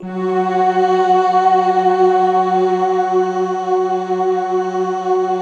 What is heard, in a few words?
future choir, Attack; Optical Media International; Sonic Images Library